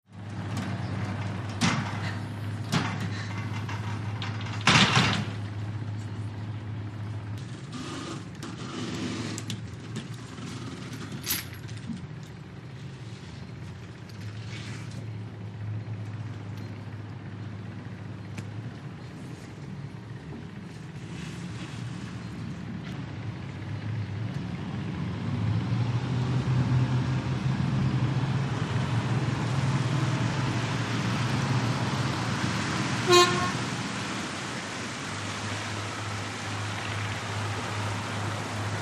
Ferry Cls Gates L

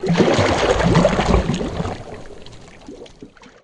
Canoe Paddle Stroke; rowboat & canoe

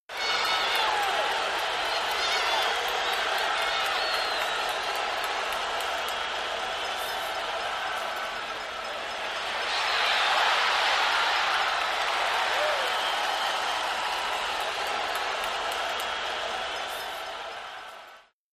Football Crowd Chee, sports arena football

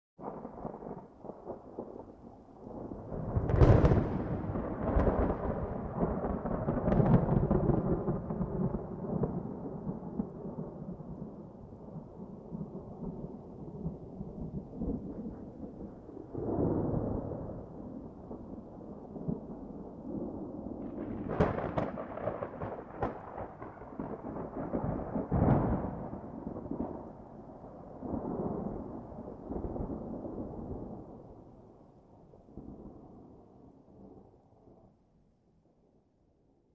Lightning; Close Rippling Ground Strikes, Thunder

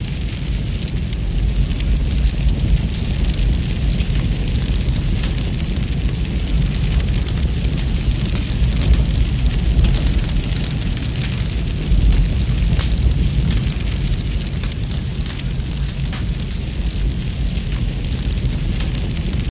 Fire Snaps

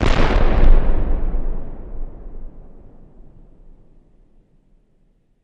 Cannon Shot Single, shots

